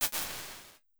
Sloppy Room, impulse responses, small spaces, rooms; Apple